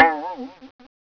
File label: Cartoon Boing